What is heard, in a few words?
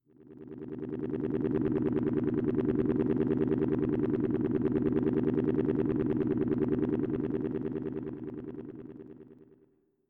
plane; Massive MIDI Collection